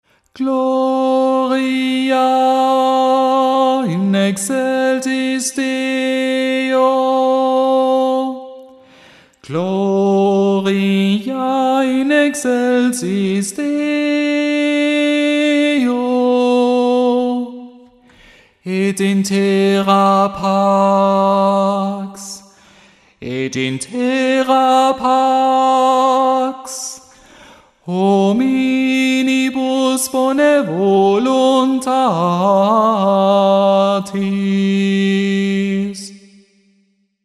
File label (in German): O Du Froehliche Mit Oberstimme